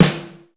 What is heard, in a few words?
ROOM S, ROOM KITS; Crypton - DrumBase5000